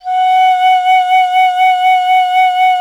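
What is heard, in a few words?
FLUTE PICCOLO F; Miroslav Vitous - Solo Instruments VOL-2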